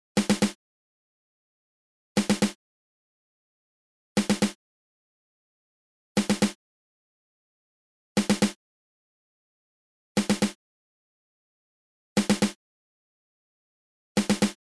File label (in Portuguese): Toque - Tarol; fanfarra